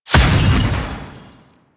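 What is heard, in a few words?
Weave Boss Projectile Impact; Warframe; Cephalon Weave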